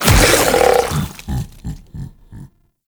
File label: Devour Regurgitate Impact C; Warframe; Grendel